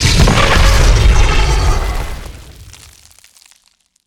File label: Charge Attack Vox FLAME; Evolve; Meteor Goliath